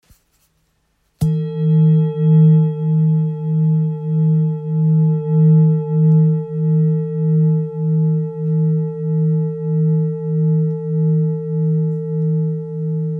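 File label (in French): Bol therapeute re diese, bol chantant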